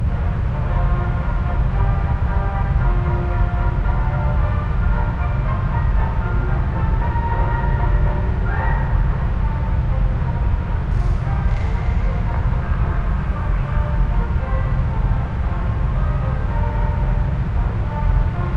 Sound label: carrier radio